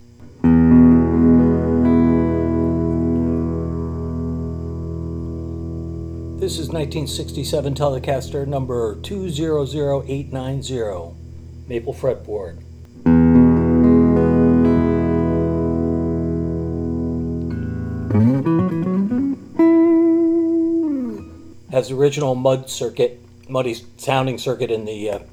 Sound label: fender telecaster olympic white